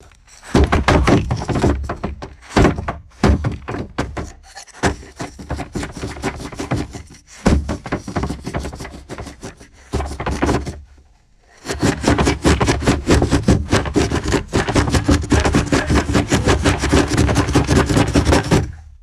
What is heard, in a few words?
rapid shaking and hitting wooden object